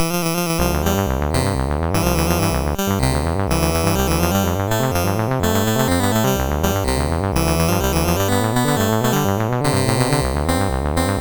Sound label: sid MOS6581R4